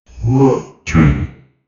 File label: videogame boss appearance